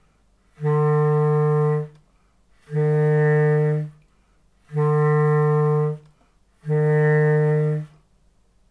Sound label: Heckel F3 side key then LT010 and repeat